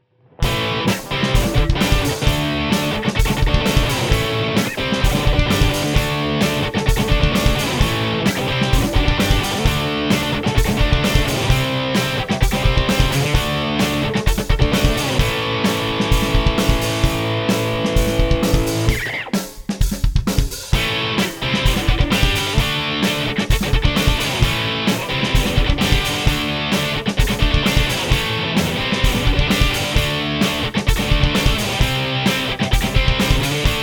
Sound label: power distortion; GNI Power Distortion